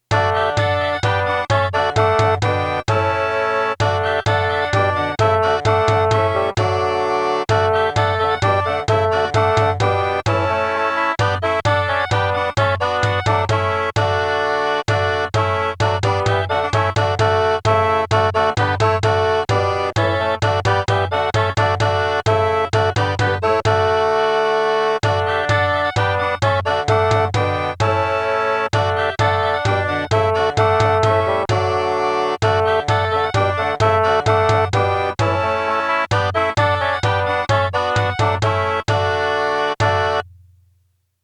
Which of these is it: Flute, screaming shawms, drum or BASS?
screaming shawms